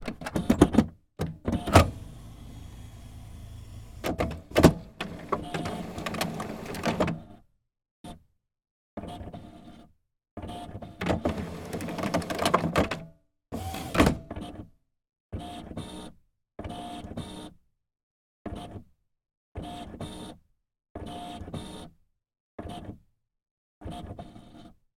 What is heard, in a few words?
office printer hp photosmart c3180 on start up sequence